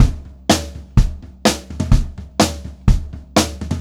126 POP TOM, Drumloops; USB Soundscan vol.37; AKAI; Pop-Rock

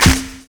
ZULU CLAP; Univers Sons - Kitch 70s

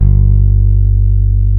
G-1 I-BS, bass; AKAI S-Series CD-ROM Sound Library VOL-8

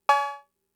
Cowbell; Fricke MFB-522

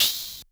Open Hat Tamb Long; Lost Tapes From Mars

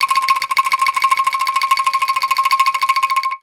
ANGKLUNGS; Voice Crystal - Steve Reid Definitive Percussion

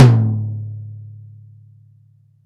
TOM E, Drum Dry; East Collexion; Drum 1

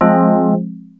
wurlitzer, dx7

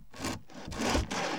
LITE BOX; Ambient Sounds; Box Noises; E-MU Producer Series Vol. 3; Hollywood Sound Effects

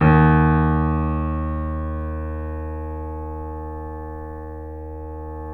The Piano, D#; InVision Interactive - The Piano

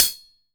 JAZY HH, JAZZ DR; AKAI S6000 CD-ROM - Volume 1; DRUM RYTHM

